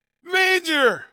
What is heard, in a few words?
coin major; coinrain